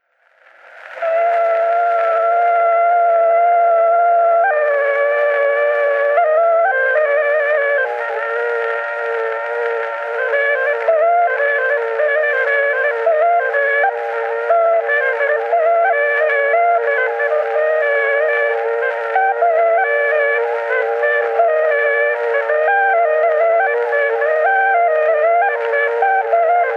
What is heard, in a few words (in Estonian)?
Torupilli lugu, Vakatantsi lugu, torupill, folk; Emmaste; Torupilli Jussi 4. lugu; Juhan Maaker